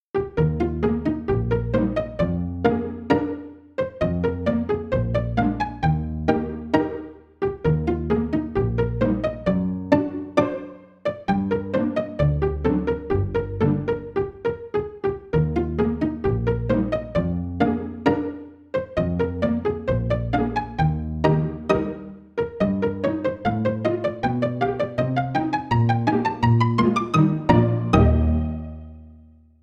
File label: pizzicato